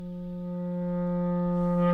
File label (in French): Clarinette Onde